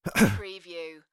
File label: Male throat clear; beanstalk audio